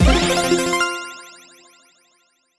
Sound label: 3 reel synced; Hot Spin Deluxe